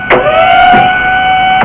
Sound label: mechanical sound; Sound Effects for Multimedia - Volume 1